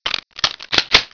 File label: reload, weapons; hlclassic